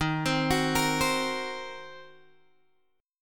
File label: Eb Minor 6th, Guitar Drop D; Ebm6 arpeggiated